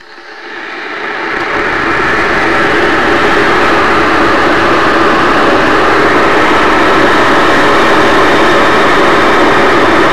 kettle boiling song and 2 sections of spoken words